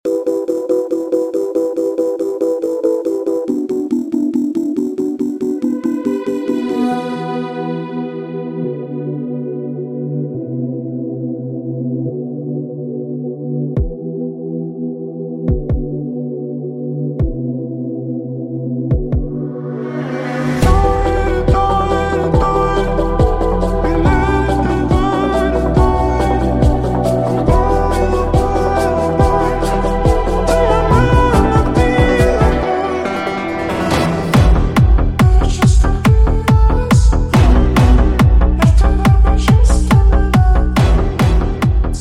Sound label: Karaoke Version